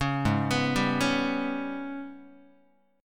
Ab Minor Major 7th Double Flat 5th Ab, Guitar; arpeggiated; 4A,4E,5A,5D,5G#,6C#; 4,4,2,5,5,x; JGuitar

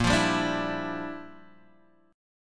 G Minor 13th A sharp

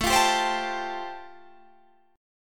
A-Minor 7th Sharp 5th, Guitar Standard, chord; 17,20,17,17,20,17; Am7#5 strummed; JGuitar

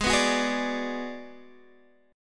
G sharp Minor 7th Flat 5th; strummed; x,11,9,11,9,10; G#m7b5

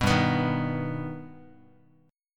G Augmented, Guitar, chord; G+ strummed; 2,3,2,1,x,x; JGuitar

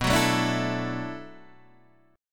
B-Minor 11th B down Guitar Open D

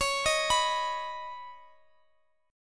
C sharp 7th Suspended 2nd, Guitar Open D

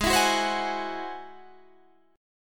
G sharp Augmented 7th G sharp Guitar Standard; G#+7; 16,19,16,17,19,16; strummed; capo=2

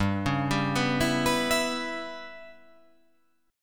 G 6th Flat 5th

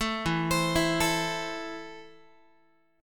E Suspended 4th Sharp 5th, Guitar, Esus4#5 chord; arpeggiated; JGuitar

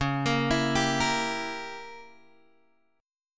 C sharp Diminished 7th C sharp down Guitar Open G

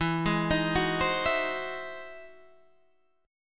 E Augmented Major 9th, Guitar, Standard; arpeggiated; E+M9; JGuitar

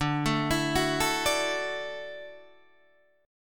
D-Major Add 9th D down Guitar Open D

